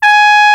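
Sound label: Trumpet mf G#2; Digital Sound Factory Virtuoso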